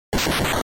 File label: Attack, NES RPG